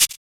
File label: Shaker; Equipped Music; Loungin House